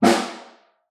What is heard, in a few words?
Far, Snare; Ableton Foundation FreePacks; Snare Designer